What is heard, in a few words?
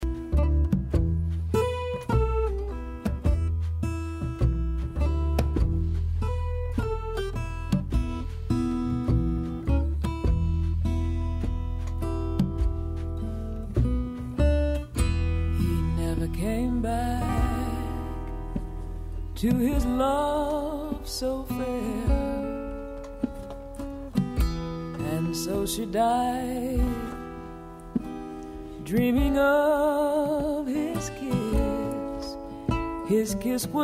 jazz